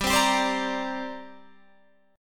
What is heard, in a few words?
D 7th Suspended 4th Sharp 5th, G